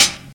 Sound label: Hip-Hop Foot Hi Hat Sound A Key, Royality free hat sound tuned to the A note